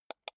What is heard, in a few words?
computer mouse wheel click soft